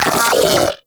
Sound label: Arachnoid Vocal Pain